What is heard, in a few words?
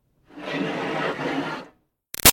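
Water Spray Interior Car By Hood Med; Car Wash; BigRoomSound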